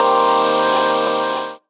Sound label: PAD SHUSH; Rawcutz; Nu Forms